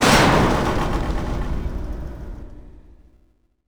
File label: land hard catwalk